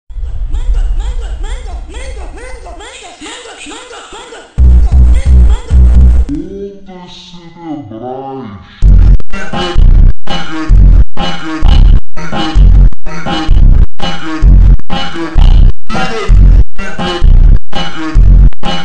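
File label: MONTAGEM FUN superbass reverb slow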